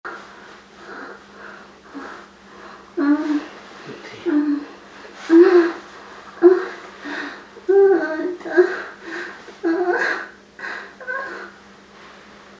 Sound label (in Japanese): えち音声